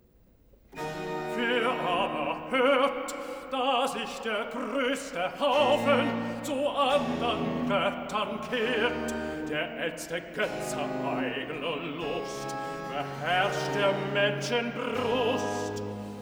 Cantata, Recitativo (Bass); BWV 76 'Die Himmel erzählen die Ehre Gottes'; Bach; Paris; 2nd Sun After Trinity

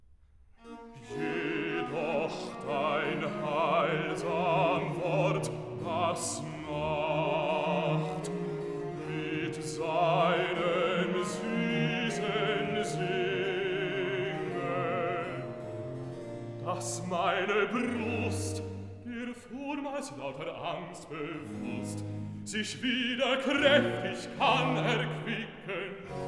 Chorale & Recitative (bass); Cantata BWV 113; Jedoch dein heilsam Wor; Johann Sebastian Bach; Gardiner

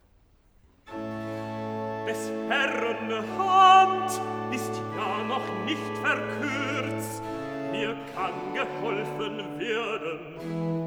Cantata, Recitativo (Ten; BWV 109 'Ich glaube, lieber Herr, hilf meinem Unglauben'; Bach; Greenwich; 21st Sun After Trinity

